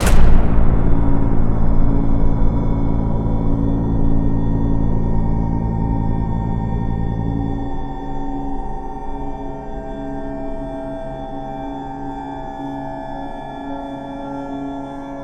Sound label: Cinematic Metal Impacts; boom library